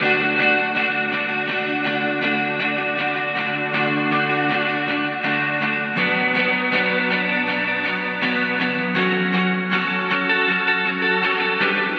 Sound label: D Guitar Chords, Dream Pop Guitar; CherryPie; Guitar Hypnosis